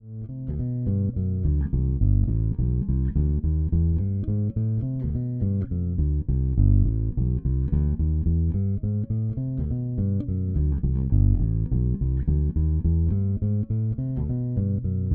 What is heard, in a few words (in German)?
Sadowksy NYC Jazz Neck Pickup aktiv